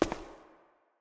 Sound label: cave grounds step; Golden Crayon Sounds